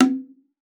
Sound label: BONGO, BONGOS; Xsample VOL-9